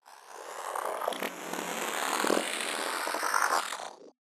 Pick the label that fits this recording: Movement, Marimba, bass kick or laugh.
Movement